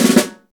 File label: snare, Roland; LA Composer